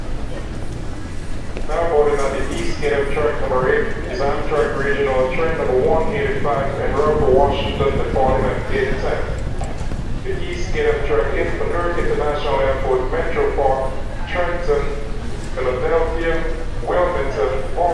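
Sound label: St announce, station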